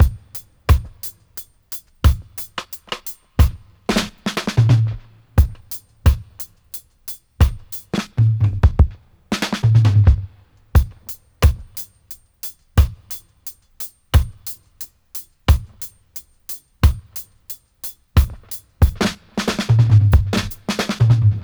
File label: 88 dry, drum loops, dub; Drumdrops In Dub VOL-1